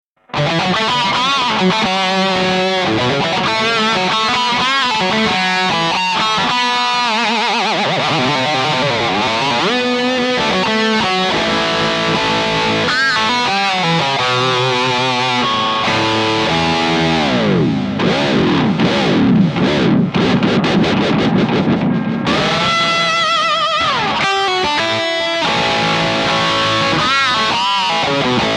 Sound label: russtone rg200f tremolo